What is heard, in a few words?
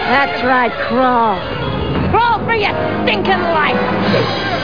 crawl